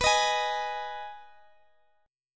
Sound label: B 6th B down Guitar Open D